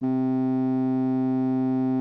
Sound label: Instrument